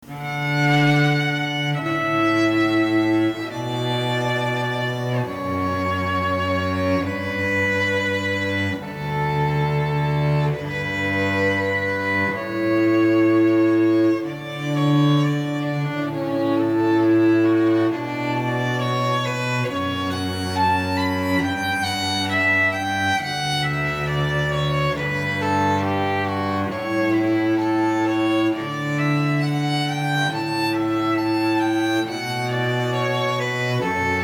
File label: Canon in D, duo; Pachelbel